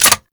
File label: Reload Dual Pistols; Warframe; Grineer; Queen Guard